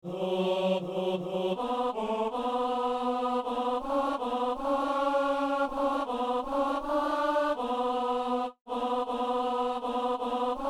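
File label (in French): ténor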